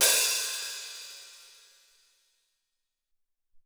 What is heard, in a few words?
Gym Hat, Alesis SR-18